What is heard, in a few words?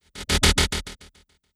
Strobe Transition